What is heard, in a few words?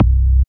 BAS, Eurotech; Sounds Good